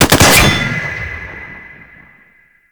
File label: Grineer Pursuit Space Ship Missile Ability; Abilities